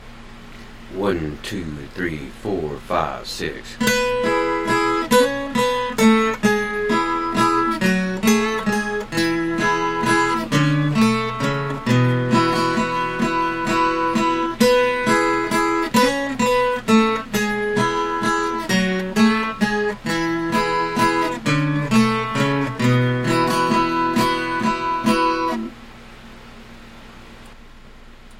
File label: both guitars